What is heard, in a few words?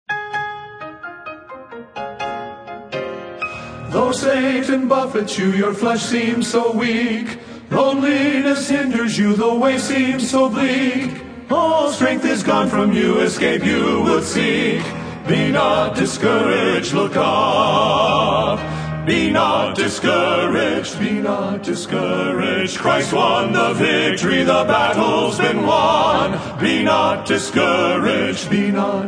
Men's Quartet